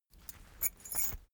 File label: key ring small trousers pocket put